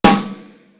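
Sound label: CANON SNARE; East West; Global Sounds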